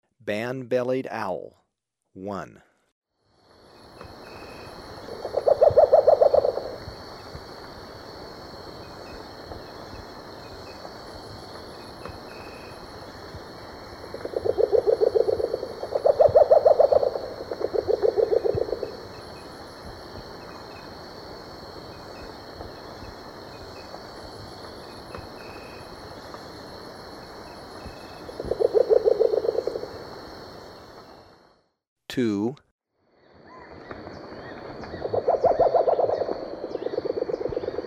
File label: Band-bellied Owl; S. America